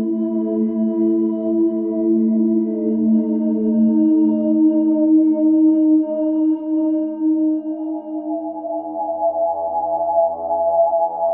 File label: Palace Music Loop